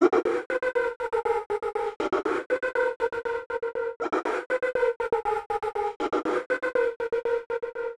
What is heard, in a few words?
Stuttered Vox 120 BPM F min; Vocals; 99Sounds; Monosounds